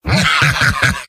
laugh evil; heavy; video games; source engine; tf; mvm

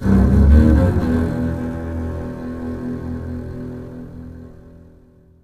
boomer bacterias, music; left4dead; Source engine

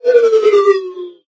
robot synth; Source Engine; Portal 2; robot parts

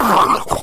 shoved, infected, rage

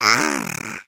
tractor beam; Portal 2; Source Engine; video games; eggbot

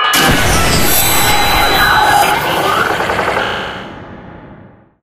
dinosaur fizzle, ambient; video games; source engine; portal2

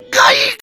become enraged, infected; Left 4 Dead 2; Source Engine

